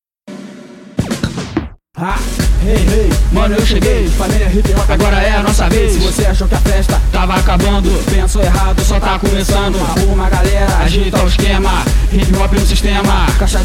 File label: hip hop